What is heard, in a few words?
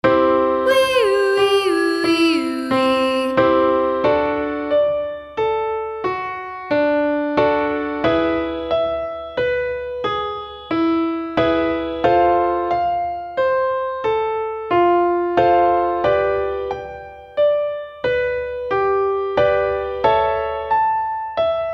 Descending Arpeggio We